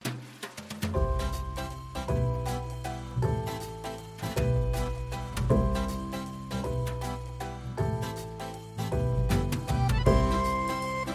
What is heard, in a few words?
French music ringtone; French Music (2)